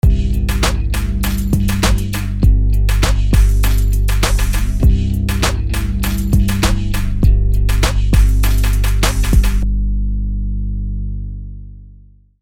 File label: slightly chill